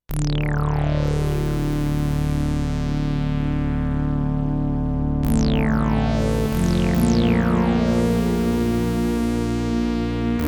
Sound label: ANDROMEDA A6 Multimode Lead